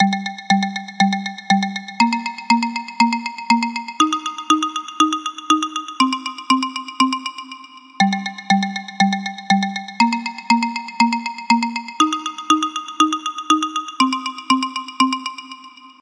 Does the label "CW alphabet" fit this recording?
No